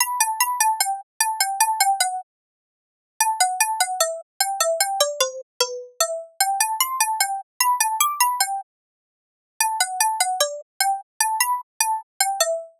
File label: Lead Loop E Ding, Dubstep, 150bpm; Ghosthack; Dubstep Sound Arsenal